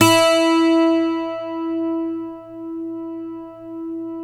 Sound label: 12 string e3, guitar